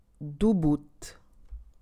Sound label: alert